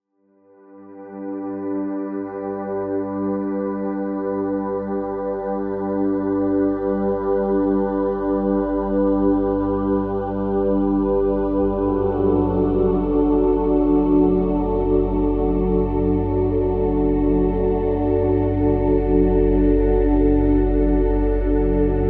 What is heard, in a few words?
Deep Sleep Relax